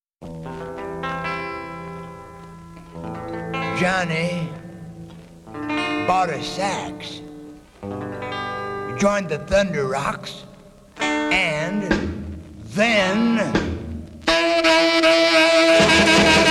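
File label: sax tvist tants, sax twist dance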